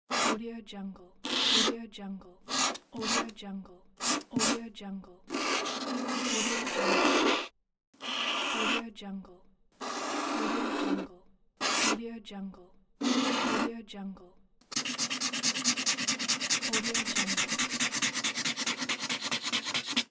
marker highlight and scribble

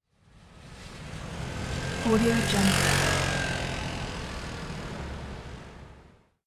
scooter pass by

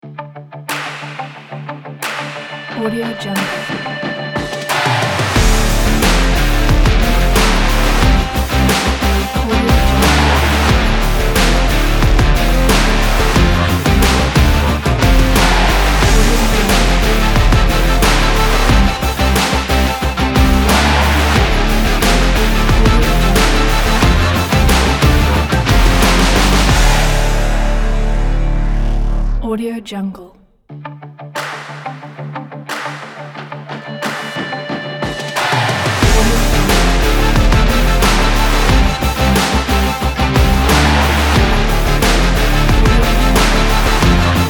rock intro ident

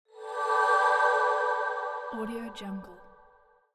heaven

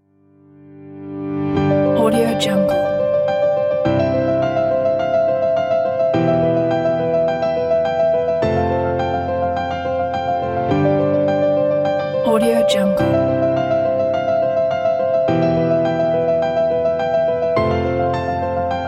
epic inspirational

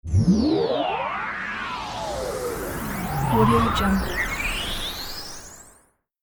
sweep phase

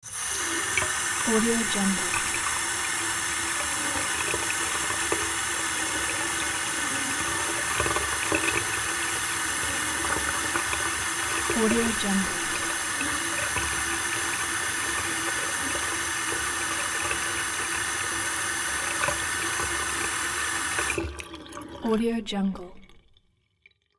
bath faucets turned on and off

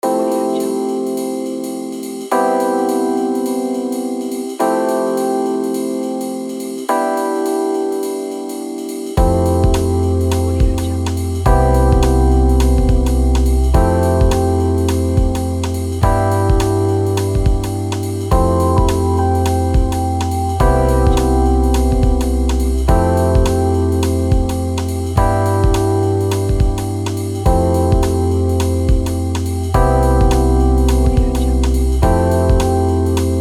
tranquil

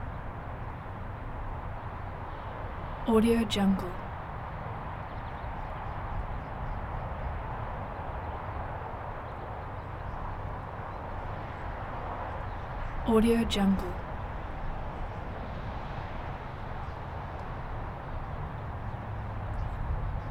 freeway traffic

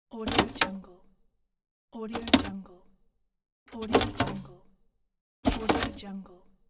metal case open

